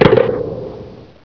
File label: tennis; hofclient